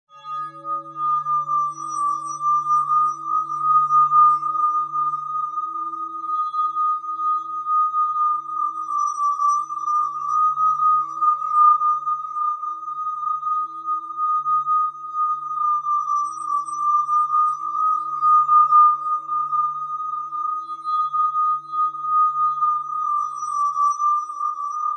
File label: High Evil Background, Creepy high-pitched background sound; Creepy high-pitched background sound excellent for evoking fright and fear, perfect for horror-thriller genre or video g; Scary Soundscape